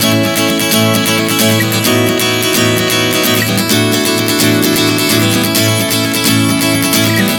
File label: A-B-D-A 130 bpm, acoustic guitar chord progressions